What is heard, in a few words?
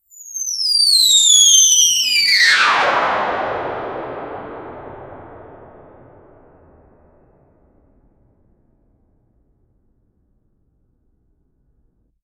bouncy ball falling from